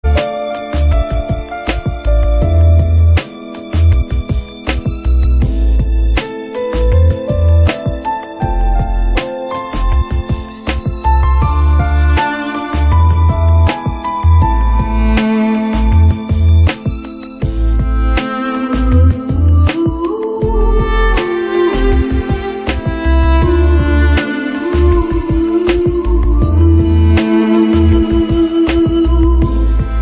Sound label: sans sax